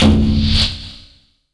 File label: EFX Metal BS; Polyend; Plughugger